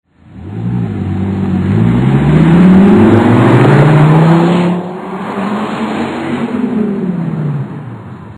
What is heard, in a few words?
magnaflow exhaust systems